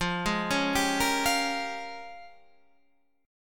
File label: Bb Minor 13th F